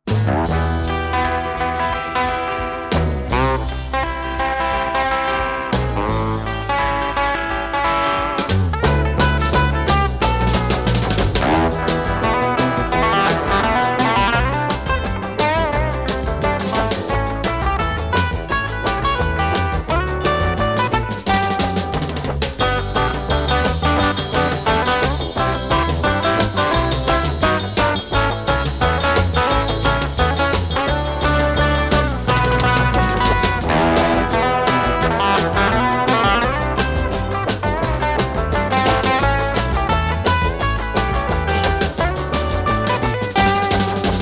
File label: instant guitar